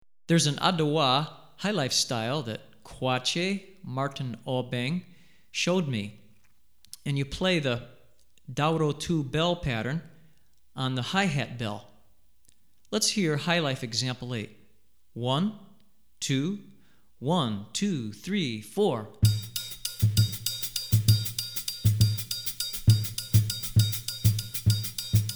Adowa, Highlife Example, West African Rhythms For Drumset; Hartigan